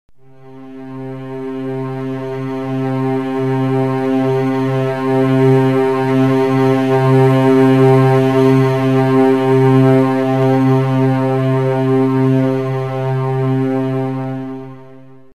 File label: Strings; envelopes attack